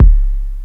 kick, ragga dub reggae; USB Soundscan vol.27; AKAI

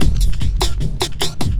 LOOP, Drum & Bass, 150 BPM

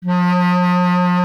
B. Clarinet

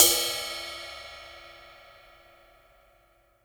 CYM, ROCKRIDE CYM

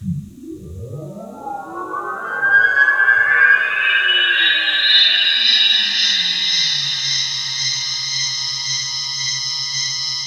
DREAMFILTER, Keys; 3D Sound; 3D Keys VOL-1

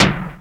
RX5 FM P; Hollywood Edge; The Big Bad Beatmachine

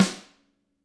GRETSCH SNRR, Drums

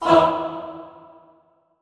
"fah", classical choir, E2; Best Service; Extended Classical Choir

